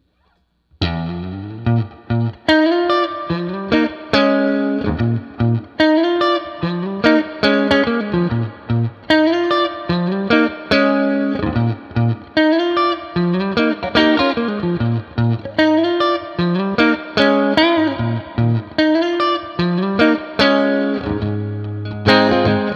Epic 1955 Middle, pickups; Klein

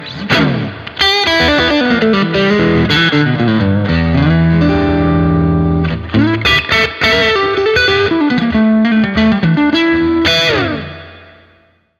neck, pickups

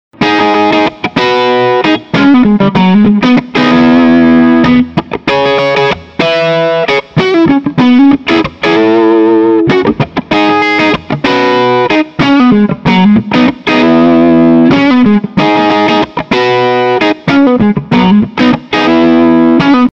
neck clean breakup, pickups; the duke

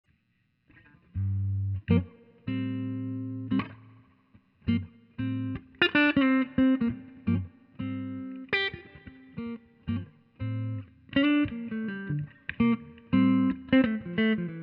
Mini Humbucker; Klein

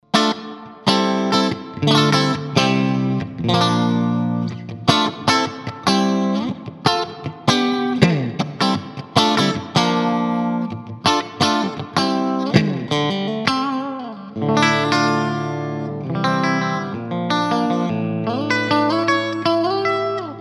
jazzy

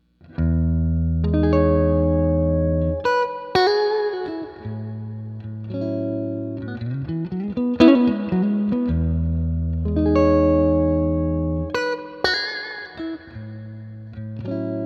Epic 1955 Neck Middle, pickups; Klein

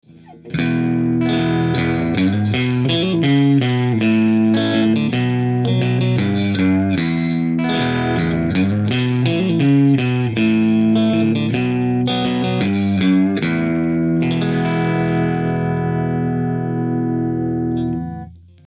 Neck Middle Dirty, pickups

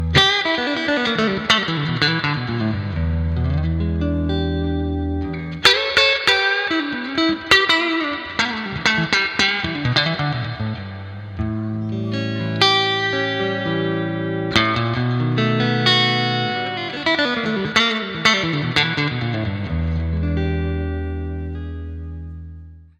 57 neck; Klein

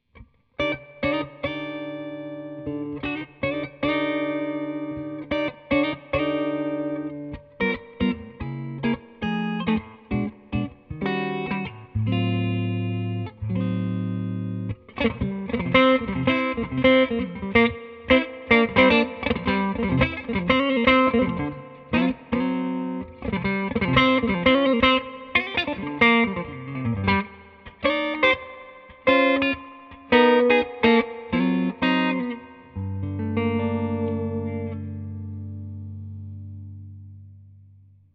firebird, pickups

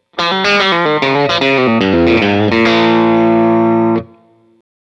Tri-State Bridge Tap, pickups

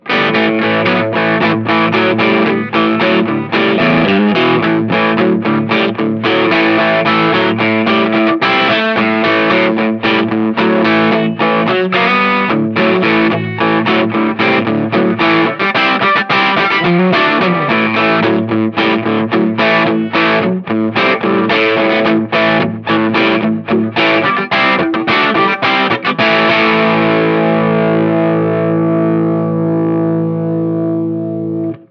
dirty rock bridge pu

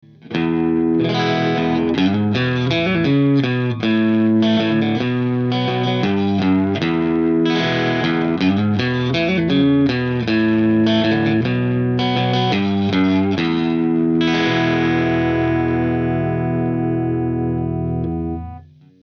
Middle Dirty

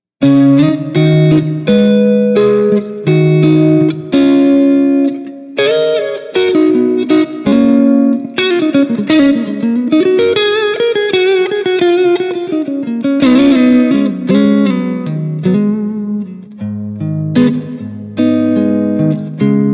62 neck break up

